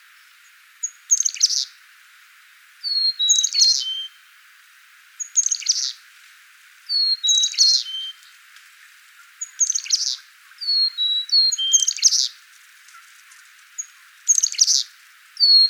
carolina chickadee

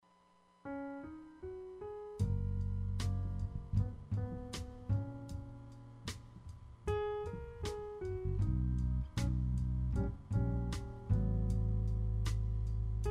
jazz

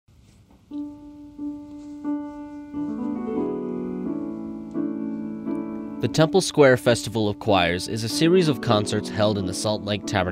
choir festival

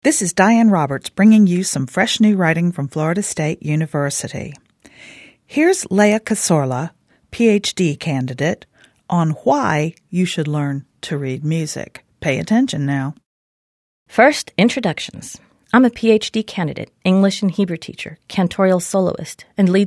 piano

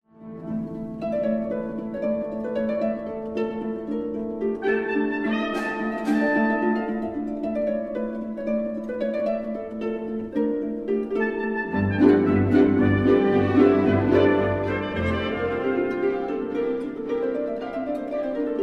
harp concertino excerpt; tailleferre

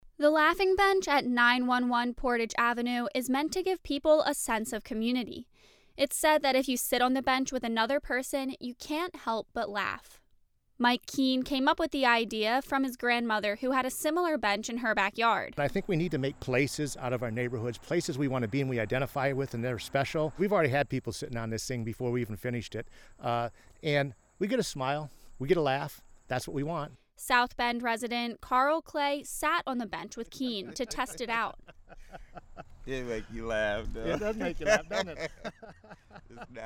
laughing

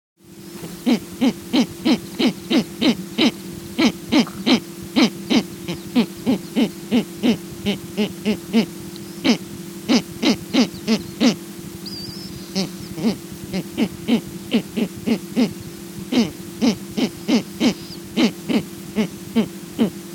Snowy Owl Male bark calls given in alarm at nest

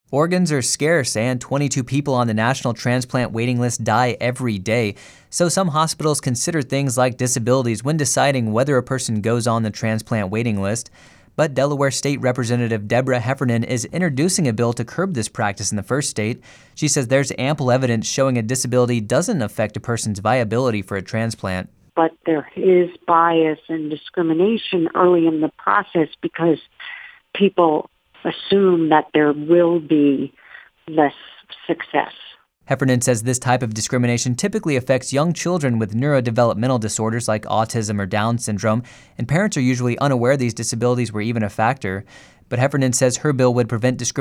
ORGAN

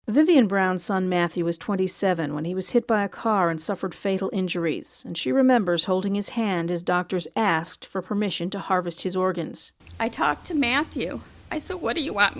Organ

